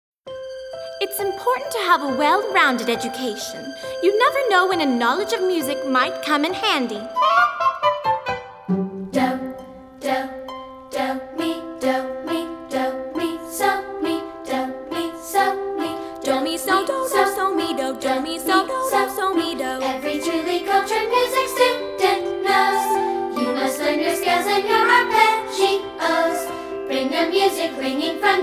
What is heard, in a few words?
scales and arpeggios guide vocal